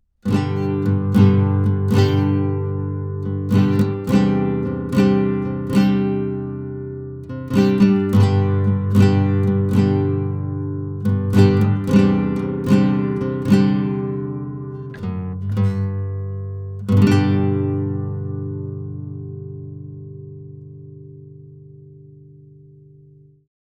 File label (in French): Guitare Reglage neutre Proche; SparkSL